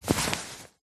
foley clothing high vis jacket thick hand into pocket; zapsplat